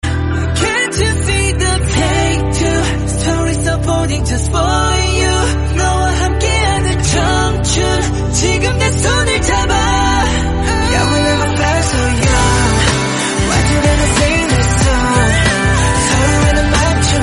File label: korean song